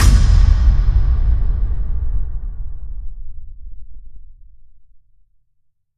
Big Hit

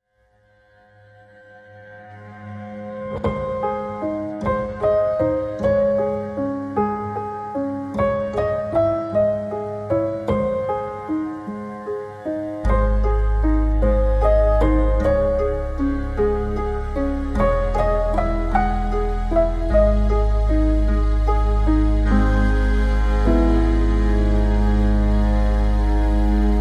Ambiance, No Cello Topline Mix

